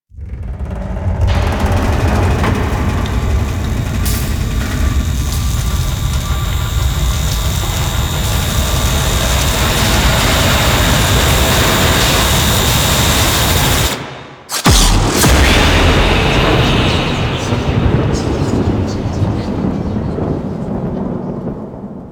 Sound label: Ship Jump Catastrophe; Cinematics; Warframe; The New War; Zariman